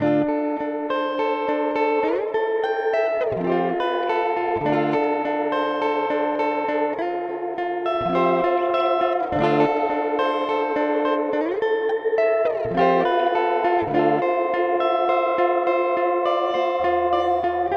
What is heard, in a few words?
Hall; Walrus Audio R1